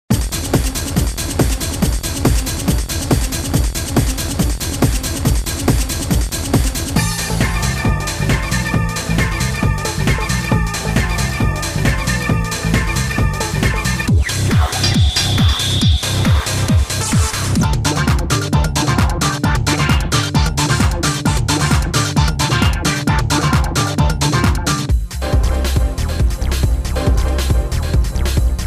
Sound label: Roland D2